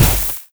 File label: sfx skill, ZombieSkill SFX; IdleRPG2024